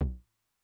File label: Low Tune Short Decay, 909 LOW TOM; classic machines; LT - LOW TOM